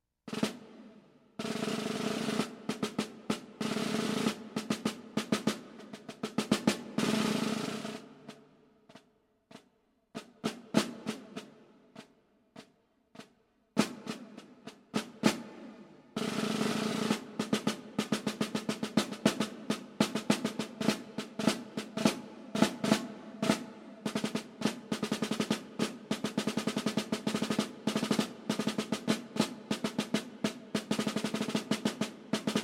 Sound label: snare drum